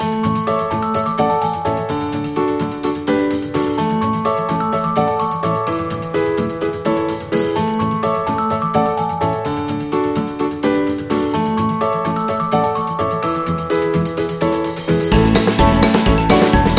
Funny Cartoon, happy music

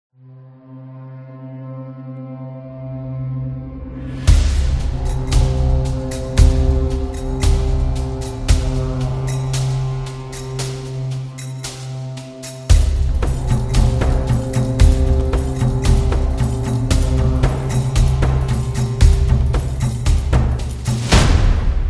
Tribal Drum

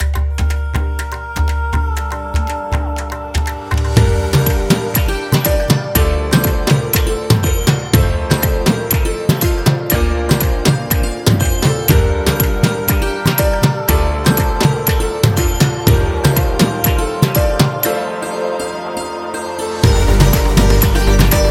India Background

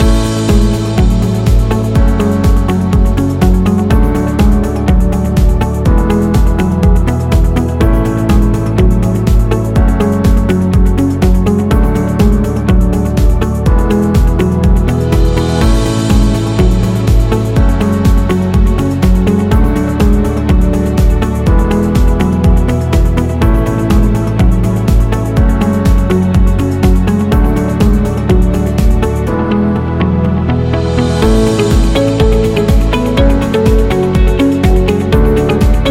Business Loop; corporate interview

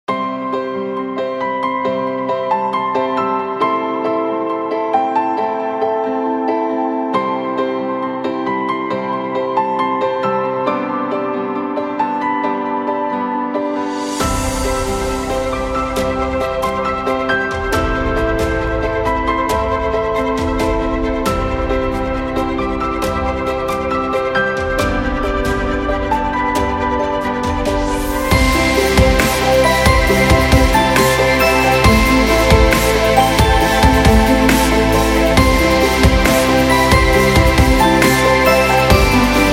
Inspirational Cinematic, corporate music; corporate music vol 16

